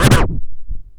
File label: ST SCRTCH, SCRATCH; AKAI S-Series CD-ROM Sound Library VOL-4